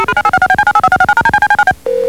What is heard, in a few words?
TOUCH TONE, FX; REAL INSTRMT; Rubber Chicken Software Sample Bank